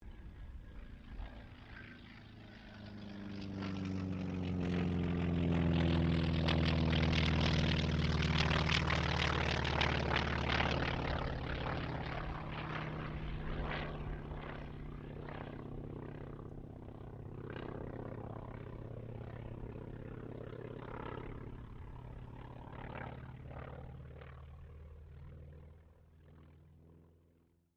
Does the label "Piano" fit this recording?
No